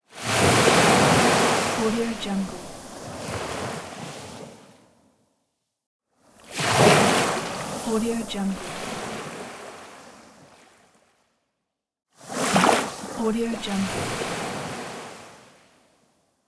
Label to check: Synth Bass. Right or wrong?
Wrong